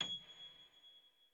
piano high

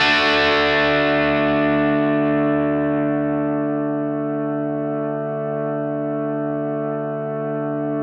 D

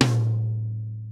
Drum Tom

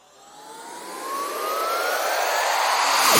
sweep down

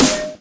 electronic; osu

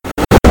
sword rev; osu

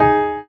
Drop Lead; osu

